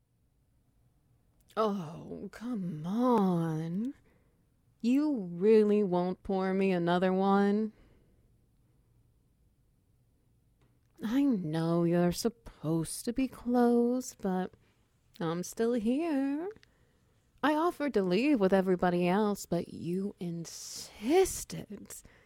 Wet Noises

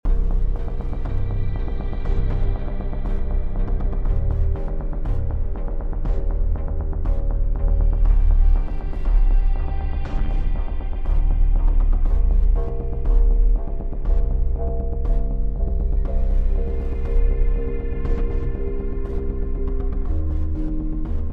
suspense loop

